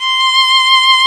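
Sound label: ARCO VIOL right, Solo Violin; E-MU Producer Series Vol. 2; More Studio Essentials; Composer; St. Arco Solo Violn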